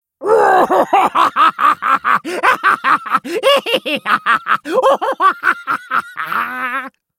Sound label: hyena laugh; Warwick